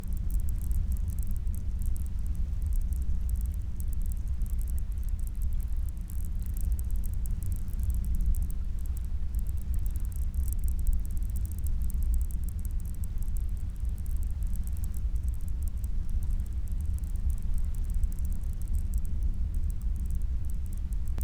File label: Hydrophone